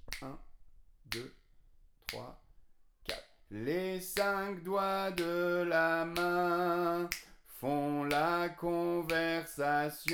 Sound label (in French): couplet avec pulsation